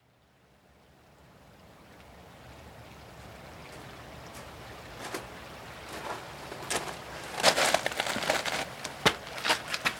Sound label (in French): Extraction Quartz